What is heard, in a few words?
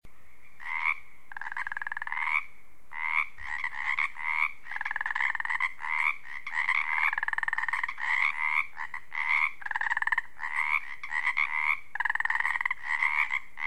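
Wetland pacific chorus frog chorus; children of morta; Tutorial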